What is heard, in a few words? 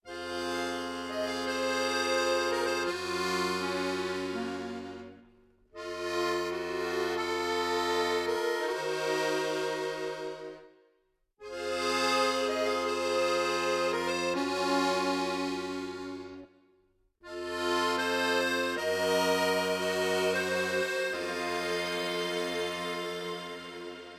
Accordion; legend of zelda the breath of the wild the champions ballad